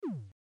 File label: shoot